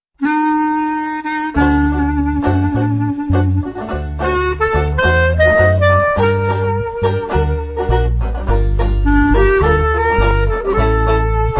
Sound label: guitar